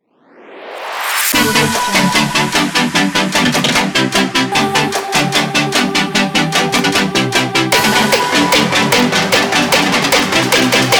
dubstep; thisisjbc

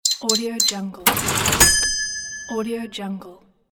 cash register

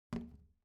object plastic trash can close soft; smartsoundfx

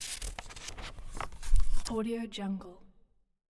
page turn

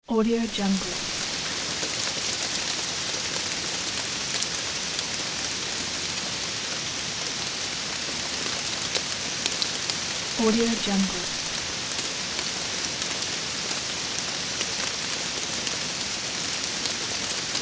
light rain with wind

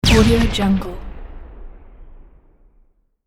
medium grenade explosion

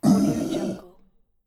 horror ghoul monster voice

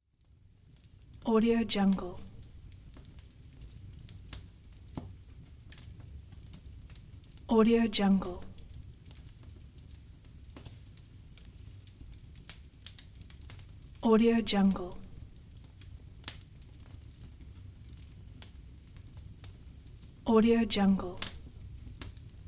fireplace

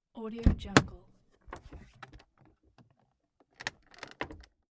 car front door open interior

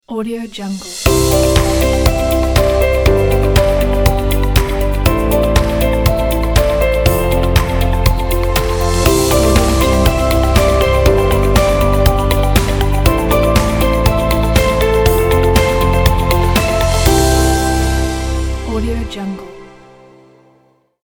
logo corporate

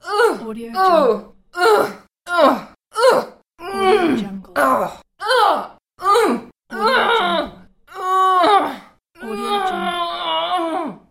female grunts and groans

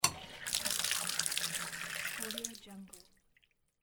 shower water valve open and drain

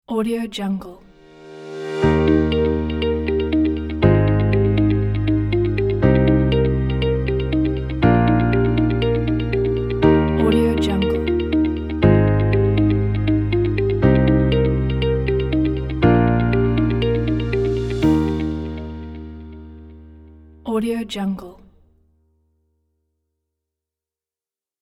commercial corporate logo

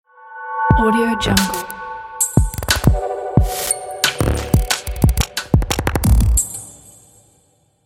digital glitch beats logo

